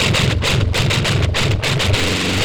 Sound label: Treat Guit, Industrial Nasty